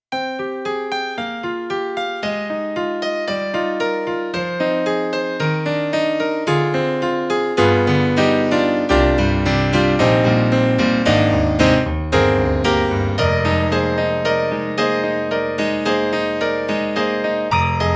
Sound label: Korg Triton-Rack Attack Piano